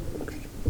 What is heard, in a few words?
horseshoe